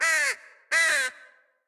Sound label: Ambient